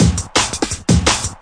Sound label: tekstep with 909, drum loops and breaks, 170 bpm